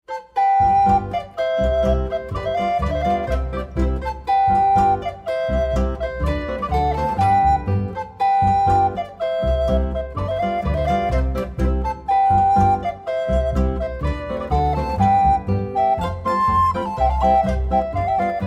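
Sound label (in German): Holzbläserquartett Polka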